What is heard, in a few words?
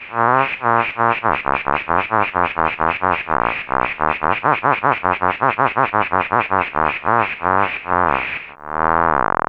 Laughing Korg